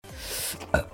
burp

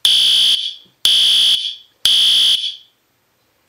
fire alarm

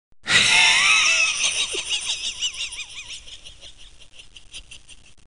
laugh; stauf

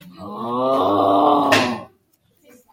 auughhhh

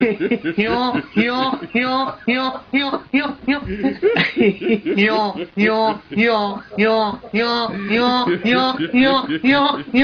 old man laughs

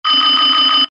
fire

